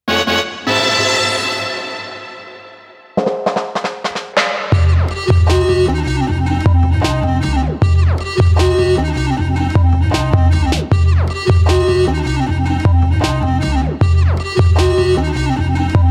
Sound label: Ethno; Ethno Type